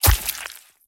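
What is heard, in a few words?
Mannequin Bite Finger; raw game audio files; MiSide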